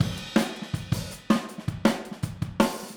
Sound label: Overhead, Drums, 162 BPM; Drums from the Big Room The Mixes; Take Your Toms Off; Verse